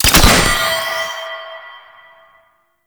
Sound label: Scatter Grenade Burst; Warframe; Grineer Bombard Manic